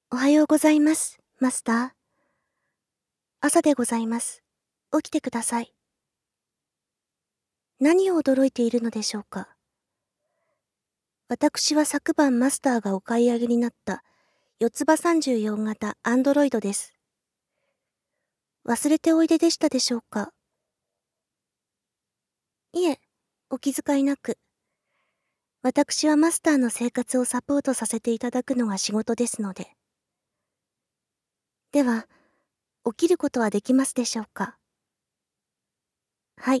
アンドロイドの事務的フェラチオ

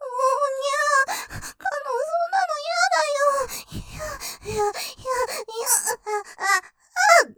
喘ぎ, 声にならない喘ぎ; ふにゃー；；; ループで楽しむ！シェフの気まぐれ抜き抜きコース

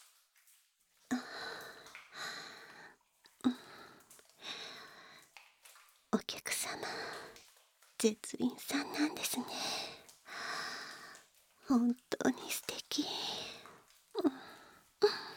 耳舐めアフターサービス 簡易モノラル編集 効果音あり

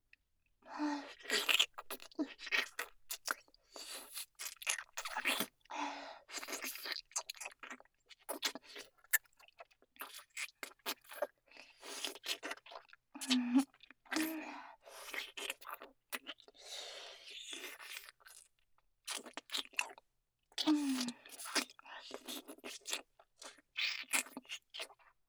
お掃除フェラ

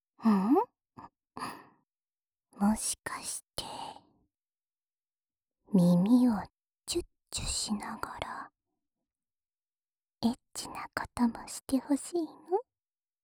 深い右の耳舐め＆オナサポ; ムラムラしちゃった? じゃあ射精しよっか♪

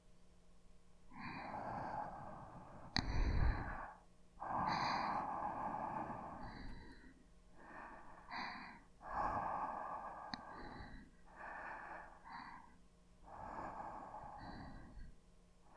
寝息; 深雪

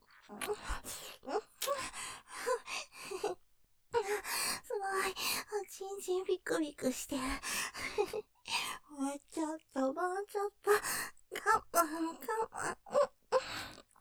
フェラ すごい、おちんちんぴくぴく♪がまんがまん♪, ASMR; ステレオ; ループで楽しむ！シェフの気まぐれ抜き抜きコース

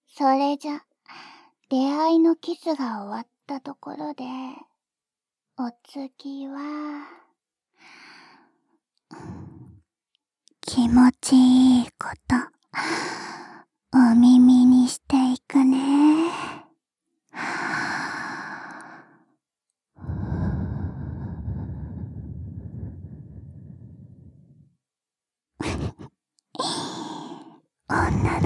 耳舐め, ASMR

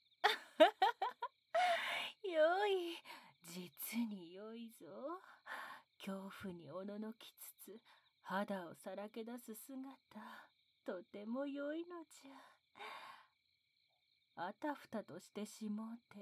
「接吻」（キス）, ASMR

